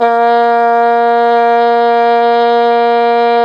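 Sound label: brass plunger trumpet, plunger mute; Plunger & Wa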